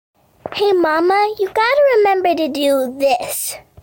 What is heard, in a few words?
Calendar notice